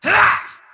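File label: voice charge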